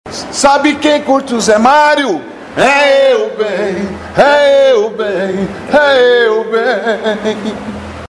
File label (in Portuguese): vinheta cantada